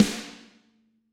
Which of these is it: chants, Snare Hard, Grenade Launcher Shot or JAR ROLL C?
Snare Hard